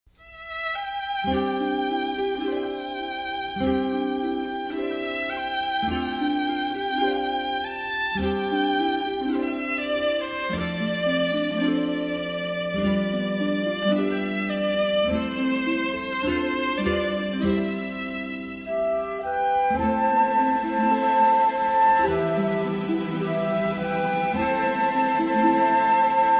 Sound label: Majesty Strings